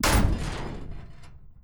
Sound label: step; brainrot; ferro sahur